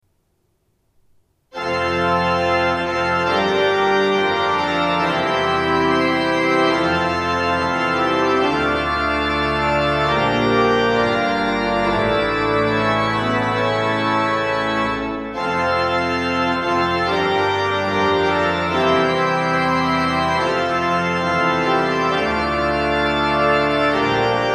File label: Fanfare; Fanfaren slik den ble spilt i Sandar Kirke. Her i Sandefjord Kirke var det litt mer balanse i bass-stemmene.; ZOOM H4n